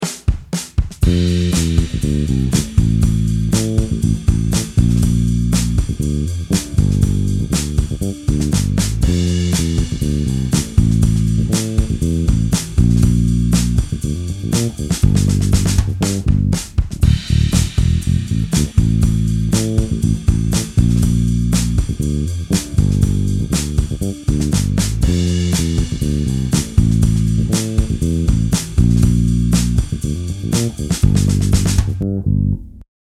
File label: Fmaj7 Am7 Bbmaj7 Fmaj7 or Gm7 TWICE